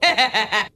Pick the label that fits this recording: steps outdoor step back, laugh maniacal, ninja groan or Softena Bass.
laugh maniacal